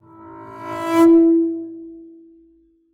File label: Cello D Bass